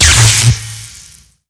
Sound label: LAST BOSS ATTACK